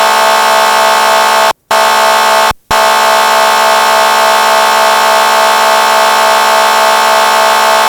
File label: HORN HORN, siren